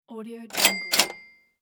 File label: cash register